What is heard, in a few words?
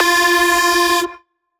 Lead - F; 99Sounds; Monosounds; Music Loops; Instrument Oneshots; Leads